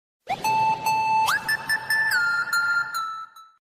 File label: apple iphone new sms tone; 2020 new i phone 11 pro